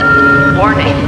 Warning; Coffee Break 3.0.1

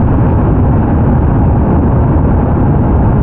tarmac; ImmersionRacing

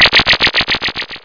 High Weapon Blast; CephaloTron

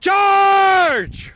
charge

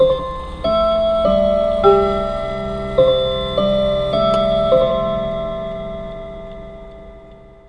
chime; BigMax nº 7 Internet Já

